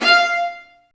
String Sections, Violas Marcato; Roland LCDP13

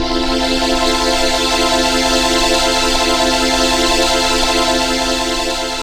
F, Pads; Zero-G; Total Drum Bass

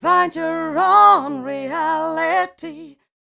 f mel d flat, fem Vocals; Mutekki Media; Diamond Vocals 2

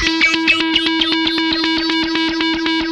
ZAP FX, Funk Guitar; 90 s Sample CDs; Zero G

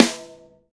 TY Rim, Roland BK-5, Snares

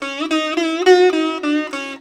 SITAR LINE; SITAR RIFFS2

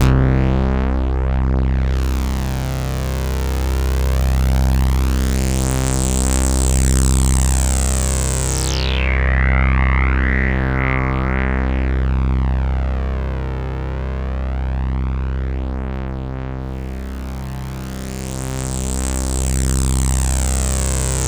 Roland JV 2080, poly saw

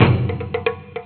PERC LP, PERC LOOP, Asian Traditions; USB Soundscan vol.60; AKAI

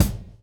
groove; AMG Gota Yashiki Groove Activator

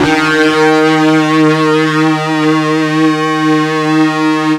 FUZZ D#2, Guitar; East West; Steve Stevens Guitar Samples Collection

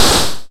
Hats Ticky Hi Open, Modular; Wiard 300 Series

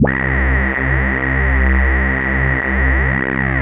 CHUNKY C3, Drums; Drums In Your FACE!

